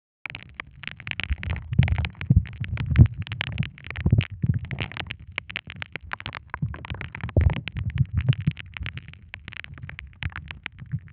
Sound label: knees cracking sound